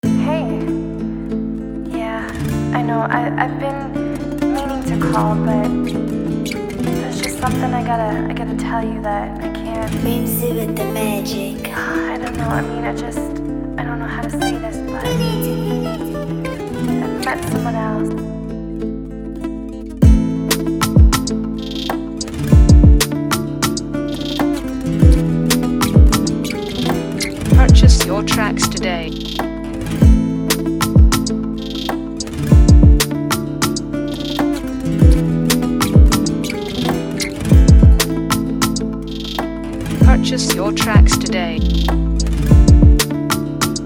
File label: Afrobeat instrumental